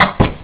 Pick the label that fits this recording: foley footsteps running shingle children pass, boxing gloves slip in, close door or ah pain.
close door